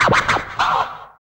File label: FX Dance